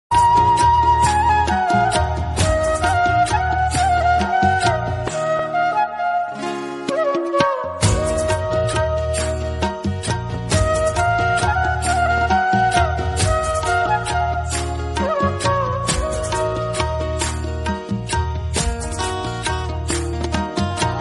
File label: best flute music ringtone best instrumental music